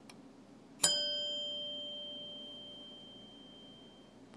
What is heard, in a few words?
new york door bell